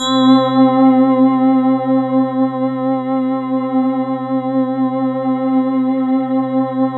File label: C5, Synth Line; 90 s Sample CDs; NiceBeats; SynthLine VOL-24